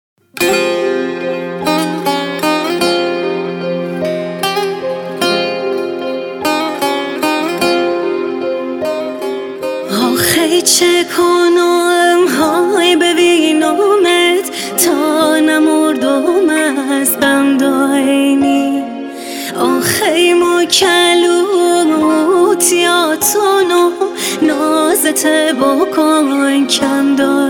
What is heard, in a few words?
lori ghamgin khoram abadi